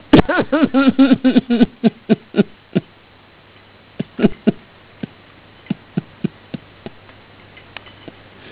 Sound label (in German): Mutti Lacht